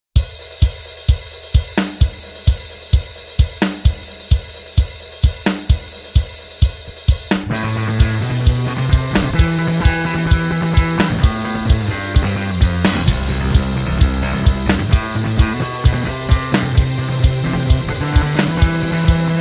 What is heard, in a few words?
SuperMetal